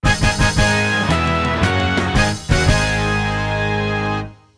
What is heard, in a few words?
Victory; WoWInterface; BigWigs